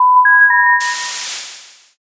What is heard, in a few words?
modem